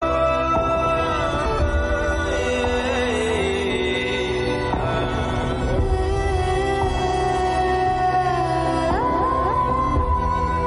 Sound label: INSTRUMENTAL RINGTONE